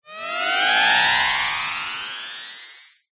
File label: magic wand acsend; zapsplat